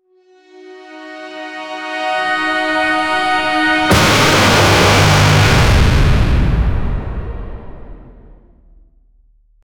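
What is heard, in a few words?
cosmic sound heroic appearance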